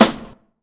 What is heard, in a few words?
Dance Drums